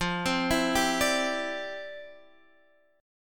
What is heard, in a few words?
C-Major Add 9th F, guitar; arpeggiated